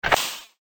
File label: concuss